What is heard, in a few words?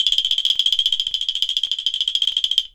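clacker toy lo; ILIO; Synclavier Percussion 2; World & Orchestral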